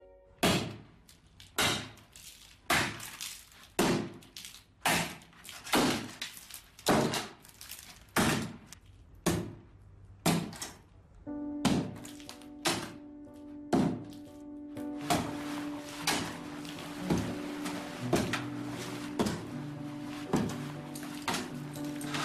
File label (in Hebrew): פטיש; סאונד של פטיש